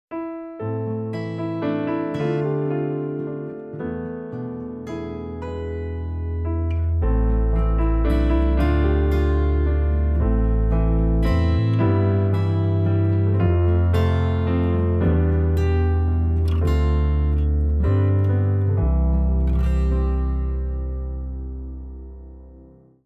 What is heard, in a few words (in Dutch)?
Anamnese instrumentaal; kyriale